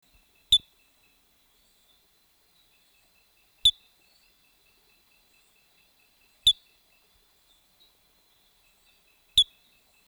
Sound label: Diasporus diastema